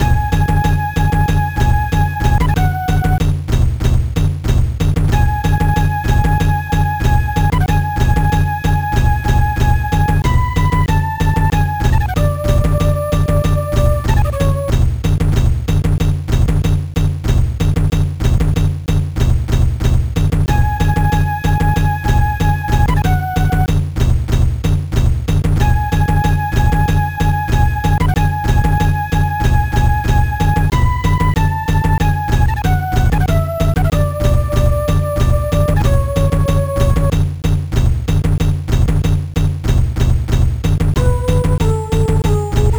amiga in game; budokan

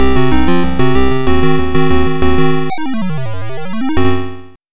arcade round; arkanoid; doh

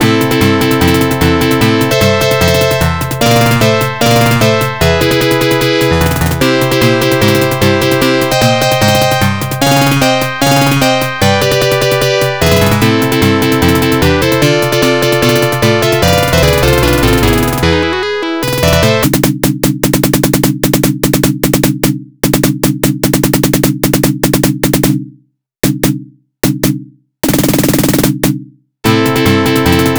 arcade background music; commando

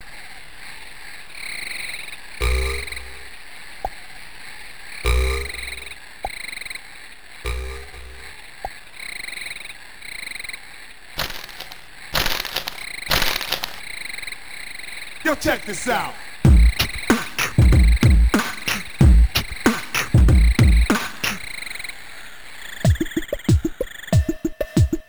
amiga megademo; budbrain megademo; diablo jungle

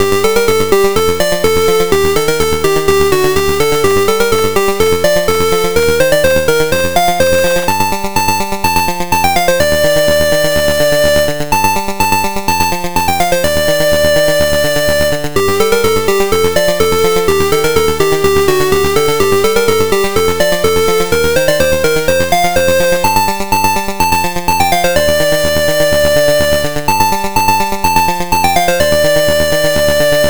c64 in game; arkanoid

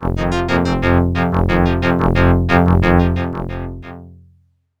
arcade stage start; gauntlet

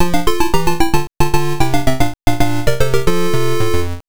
game over; msx; goonies

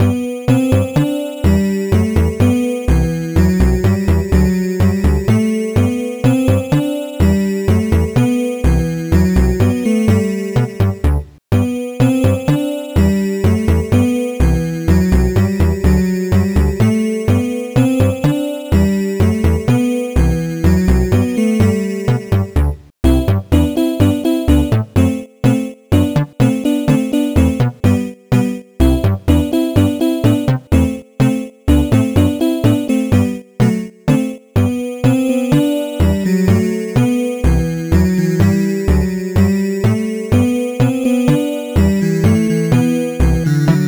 amiga in game

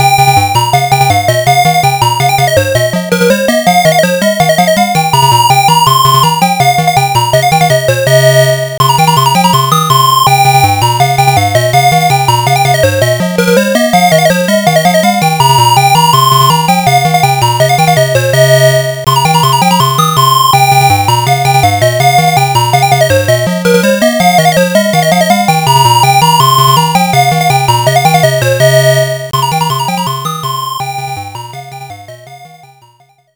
arcade background music main; yie ar kung fu

